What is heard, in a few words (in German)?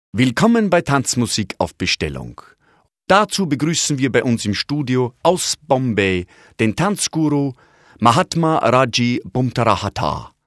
Tanzmusik auf Bestellung